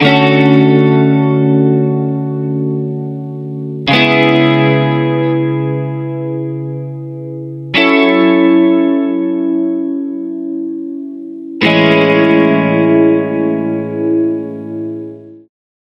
Stereo Flanger, Step Sequencer Ripples, Guitar, Dry; Sonicircuit; Syntheway